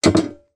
warfare battle axe put down; zapsplat

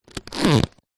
latex glove pull stretch squeak; foley

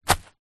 foley footstep single grass; zapsplat

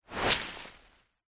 foley tree leaves branch whoosh impact designed

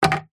foley greeting card place down on wooden surface

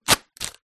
leisure party serpentine blowout; zapsplat

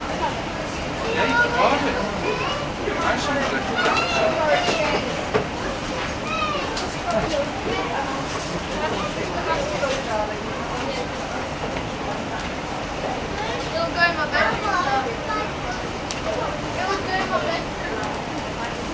ambience store garden centre internal; sound effects one

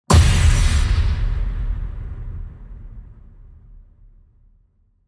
Cinematic Hit; soundbits

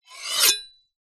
kitchen knife large scrape on metal; household

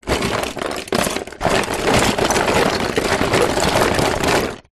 office stationery drawer rummage

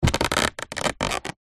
plastic creak squeak; zapsplat; foley

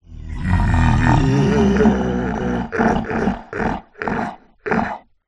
horror evil demonic laugh

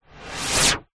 magic wand reversed whoosh build; zapsplat

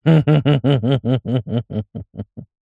human male laugh snigger closed mouth

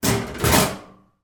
foley shopping baskets metal x2 bang together

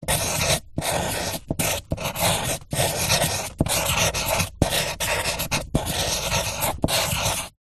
office pencil paper hollow table drawing; zapsplat

